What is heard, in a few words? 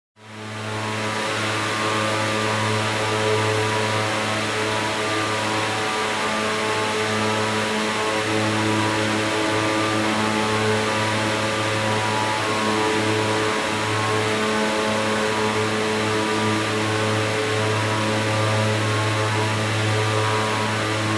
Industrial Engine running up-close